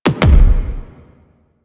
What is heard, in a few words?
Weapon Punch Double